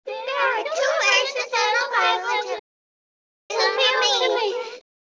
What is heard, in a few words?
kids; Virtual Pool Tournament Edition